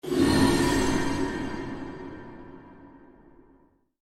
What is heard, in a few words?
Stinger Horror Musical Scary Piercing, Stinger Musical Scary Piercing Metallic Trailer Transition; Stingers; Sound Design